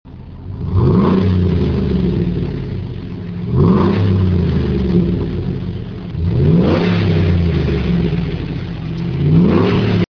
Trailblazer 6.0L Single

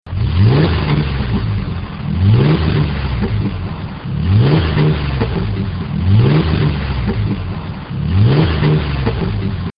Ranger 4.0L Single

